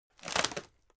wood break drop

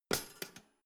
toaster pull up hard

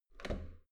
metal door heavy handle up soft

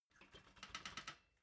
ladder metal handling soft

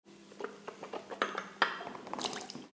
rinsing mouth with water

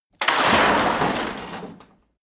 metal rock impact multi

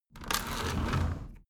window roller shutter open soft single pull

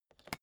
suitcase pull down latch lock

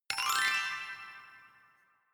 notification glockenspiel sparkle slide up soft

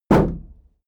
shield sheet impact dent soft

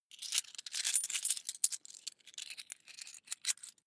coins big search long

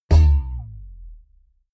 boing

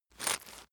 chain mail movement

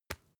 tissue tap soft

